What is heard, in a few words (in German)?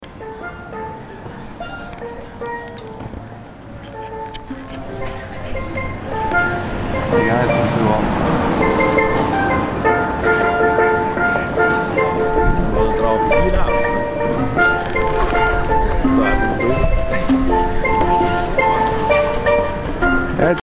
Steeldrum